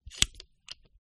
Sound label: Metal Sliding Boom Adjustment; sound spark